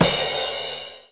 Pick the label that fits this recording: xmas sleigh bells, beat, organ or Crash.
Crash